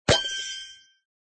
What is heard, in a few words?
big win